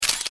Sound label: shutter